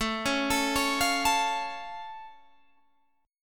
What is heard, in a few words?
A Augmented A down Guitar Open G